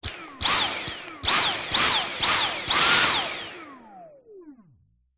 household vacuum cleaner dyson v7 stick short bursts revs close up motor